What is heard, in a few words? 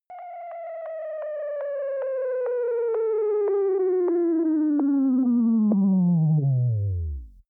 braking stop